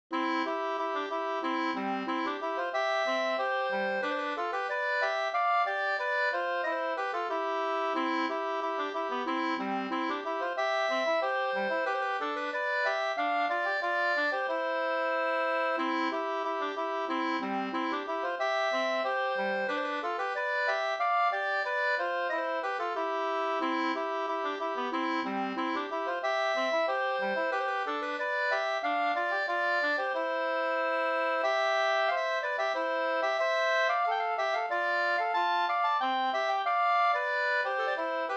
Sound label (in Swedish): polska Fardpolska